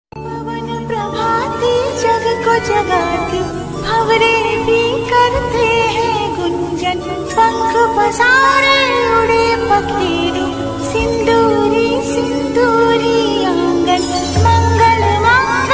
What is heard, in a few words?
devotional ringtone